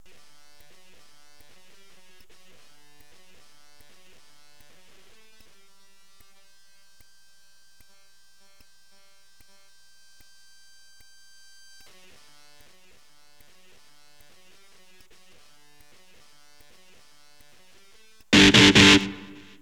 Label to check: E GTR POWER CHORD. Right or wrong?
Right